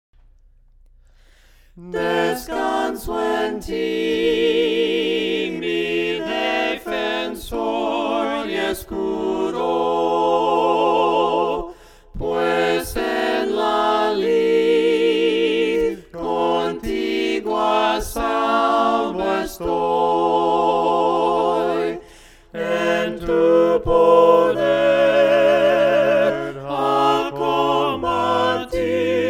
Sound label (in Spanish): VOCES, himnos a capella; cantos del camino; DESCANSO EN TI